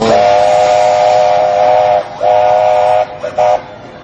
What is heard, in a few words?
horn